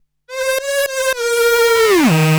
Roland D Line, Roland S100

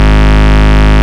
Ultimate Bass G# Root G#; Vengeance Samples

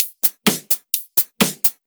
Electro Loop 128BPM; Vengeance Samples

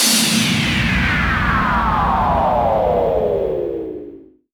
FX Sweep Down; Vengeance Samples

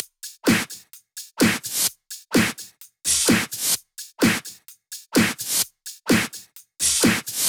128BPM Machine Kit

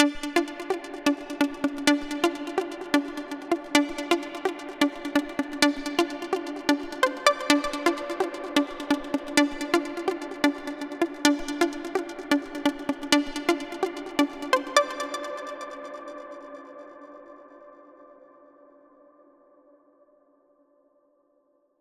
128BPM Seq Root D; Vengeance Samples; Entourage Kit

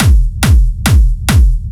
Kick, 140 BPM; Vocal-Hook Kits; Stop!

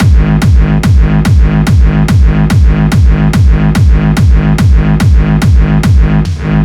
Special Sounds Root G# 144 BPM; vengeance essential club sounds-3

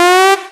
Synths G; Vengeance Samples

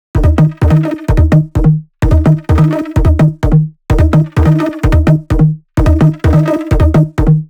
Melo 128BPM; Mini Kits

